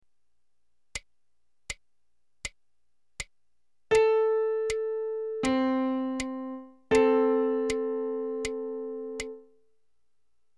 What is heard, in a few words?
interval